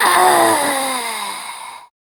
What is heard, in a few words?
Death; Warframe; Corpus Lich; Persona D